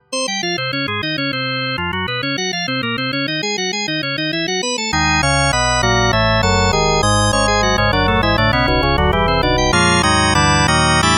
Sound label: farfisa compact organ